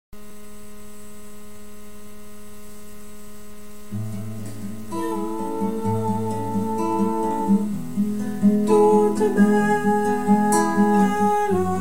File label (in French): basse; Chants Focolari en français; ma toute belle